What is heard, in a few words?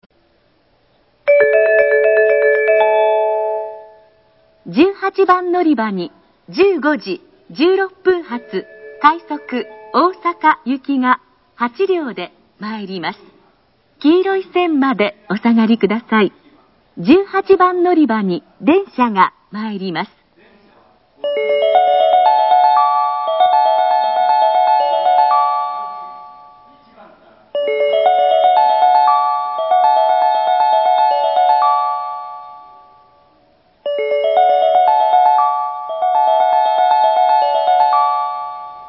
tennoji track18 rapid osaka; nishinihon